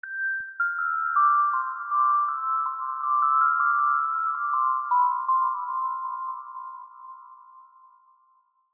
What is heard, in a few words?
Deck The Halls SMS